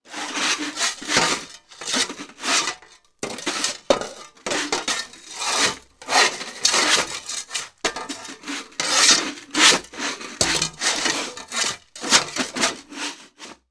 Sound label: Shovel Dirt